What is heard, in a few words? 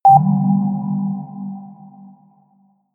notification signal